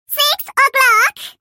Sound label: clock